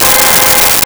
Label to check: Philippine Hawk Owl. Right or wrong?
Wrong